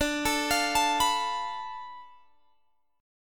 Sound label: D Minor 6th Guitar Open G